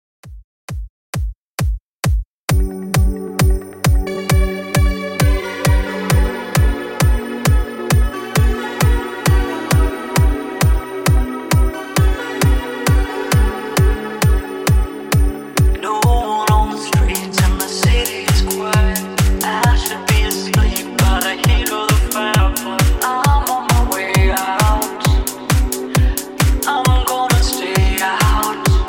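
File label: Christmas Song Extended Mix 133Bpm Clean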